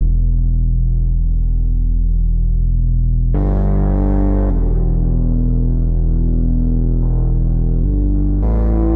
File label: Dusty Bass - Emin 107 bpm; MIDI Clips; Tonal; Motifs; Ableton; Factory Packs; Mood Reel